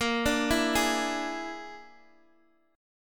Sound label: A sharp 7th Flat 5th, down, Guitar, Open D